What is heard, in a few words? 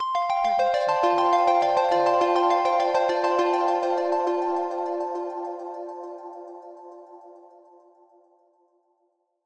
Happy Synth Ident; SoundsCrate